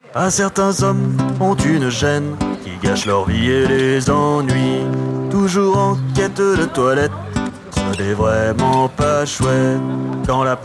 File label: french male singing fr